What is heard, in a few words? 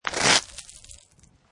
Snow And Ice Ice Or Snow Scrape With Debris; Ice Sound Effects; Weather